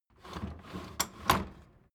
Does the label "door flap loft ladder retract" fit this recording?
Yes